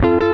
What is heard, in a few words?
FUNKY, Grooves; USB Soundscan vol.31; Miro s Grooves; AKAI